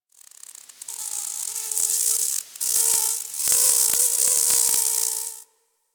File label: forest close insect buzz close; Ambience; Warframe; Grineer Forest